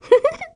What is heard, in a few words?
Peach's Giggle; MLDT